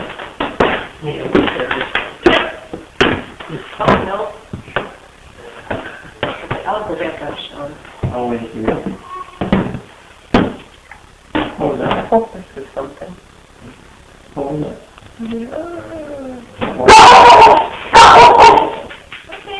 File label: Cry on Steps in Marathon City Home; EVP